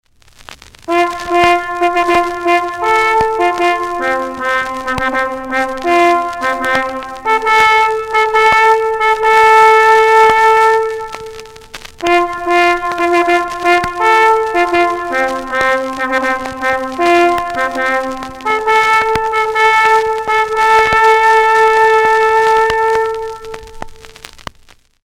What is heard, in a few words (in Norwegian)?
tappenstrek, leirsignal